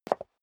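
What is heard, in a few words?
step high heels concrete single stair down, footsteps; schritte; menschen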